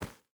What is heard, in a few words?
footstep barefoot dirt run; Rust